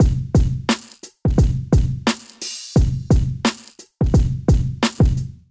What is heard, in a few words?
Kit, Golden Era Hip-Hop Drums; Black Squid; Ableton; Sound Oracle